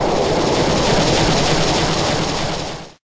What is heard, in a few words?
Petal Blizzard